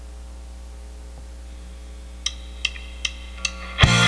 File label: w vocs maraca